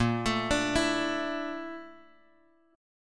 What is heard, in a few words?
Bb Major Sharp 11th, Bass Standard; 18,24,24,21; arpeggiated